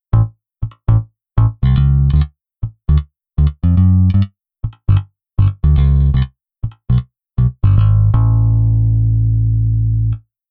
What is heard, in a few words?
Bass Rand